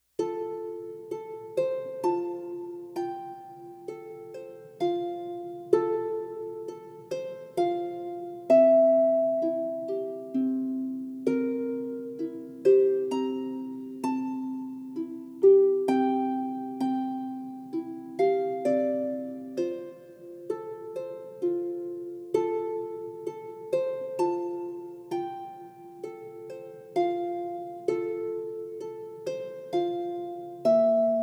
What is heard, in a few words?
Rock A Bye Baby Harp Version; Tiny Tunes; Narrative Mind